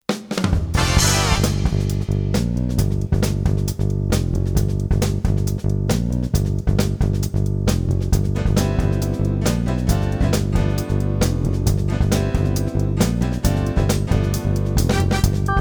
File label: beat